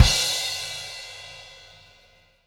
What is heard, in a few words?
CymKick, Latin Percussion